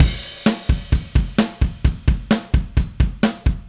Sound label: Drums Crash, Indie Rock; Sonic Foundry; Indie Rock Anthems; Roswell